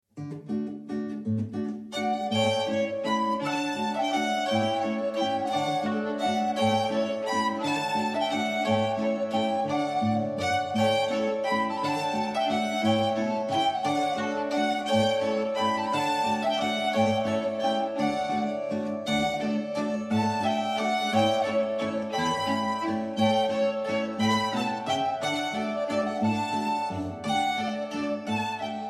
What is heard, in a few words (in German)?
Walzer